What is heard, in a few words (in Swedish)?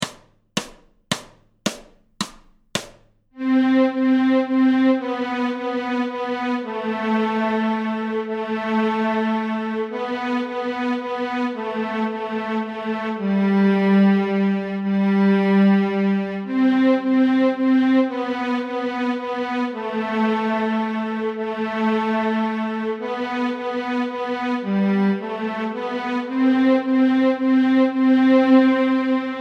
Vals från Smedbacken Stråk C, nyckelharpa; A-strängen Steg 1 Två toner i dur